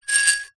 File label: glass shards x2 scrape together; foley